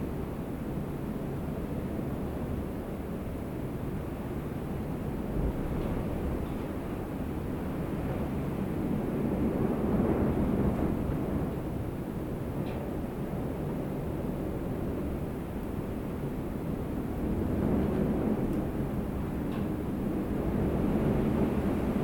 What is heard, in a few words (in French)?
amb vent